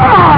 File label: argh; Amiga Soundtracker Sample Packs